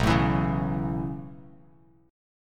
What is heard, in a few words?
B 9th Suspended 4th B down Bass Standard; B9sus4; strummed; JGuitar